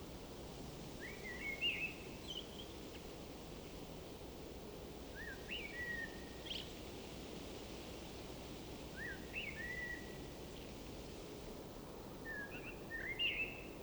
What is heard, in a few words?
Village Ambient; 7 Mages